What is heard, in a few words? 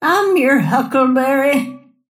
cast pain; snapfire